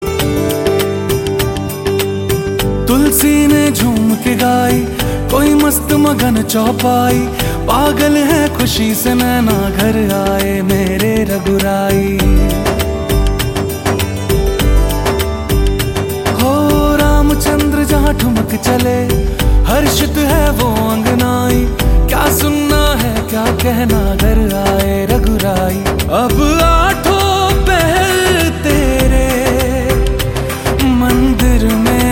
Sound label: ram lala full bhajan